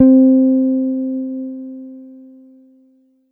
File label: Casio CTK 611 Jazz Guitar C4